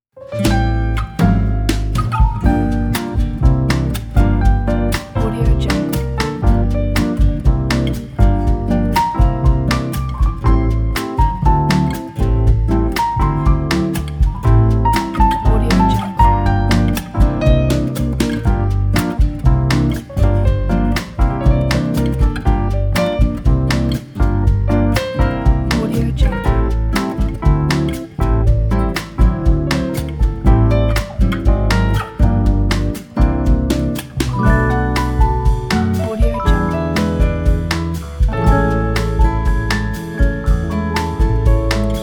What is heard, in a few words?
relaxing bossa